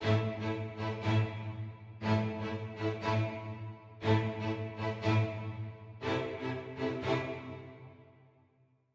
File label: heroic med start; gamerip